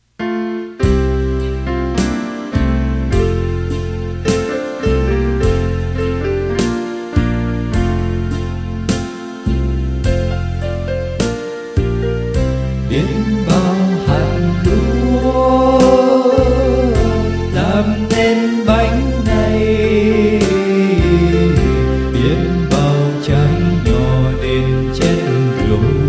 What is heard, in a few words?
bass